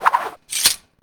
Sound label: sheath